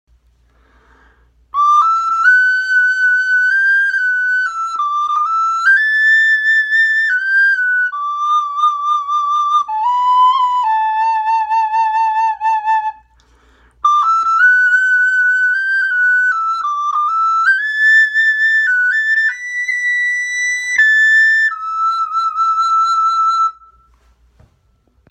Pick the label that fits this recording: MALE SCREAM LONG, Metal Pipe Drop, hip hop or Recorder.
Recorder